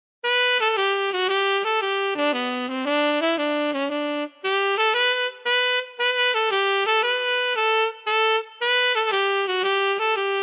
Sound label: T sax